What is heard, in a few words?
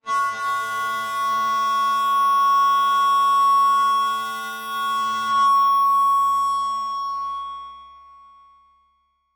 Transition Stab Dark Bell; Production Element